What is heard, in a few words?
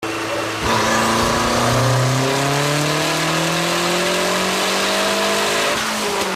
daytona rear, dyno